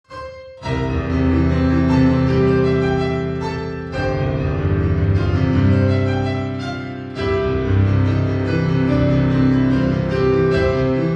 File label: brahms autumn c maj only